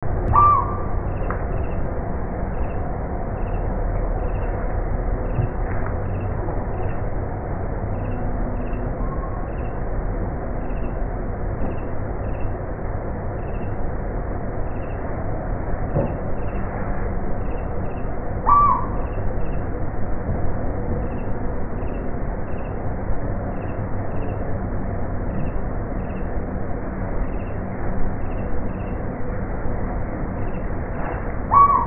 Asian barred owlet Glaucidium cuculoides, Kaziranga